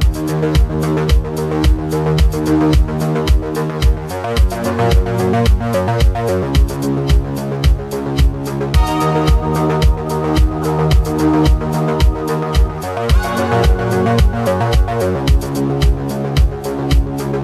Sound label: RUNNING WILD LOOP, FREE CASUAL MUSIC PACK; FruitMaster